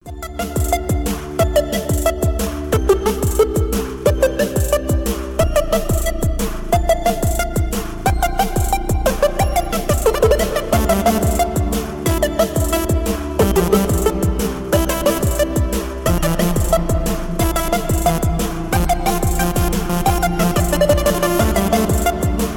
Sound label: drum bass; клубные, electronic